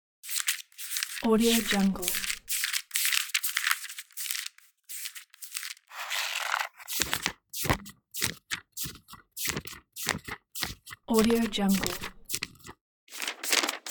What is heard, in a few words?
book pages flipping